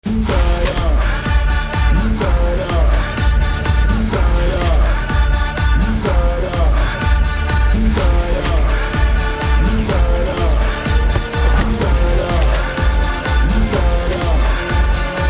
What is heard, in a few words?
BGM Humming Ringtone; Trailer